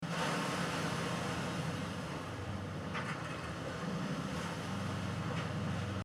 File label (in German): DPA 4466 Omni Fischkutter Background only